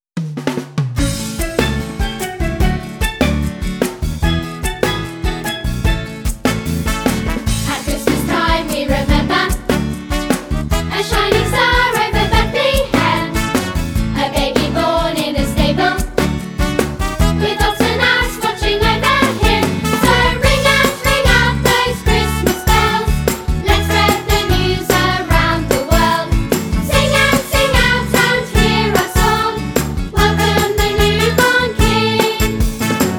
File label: Ring Out Those Bells, nativity songs